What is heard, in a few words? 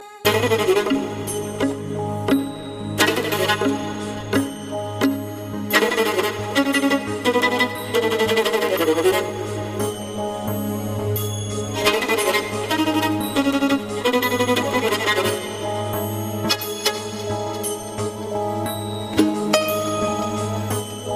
morning raga violin